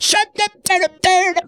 Funky Vocals; USB Soundscan vol.18; AKAI

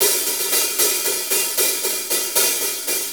6-8 HiHats, HiHat Ride Loops; Drum Tools

